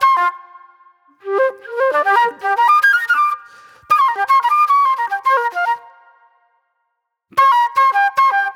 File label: Raw Funk Flute